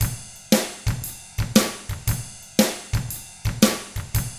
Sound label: SHUFFLER, Drums; ILIO; Double Platinum Drums 1; 116 SHUFFLER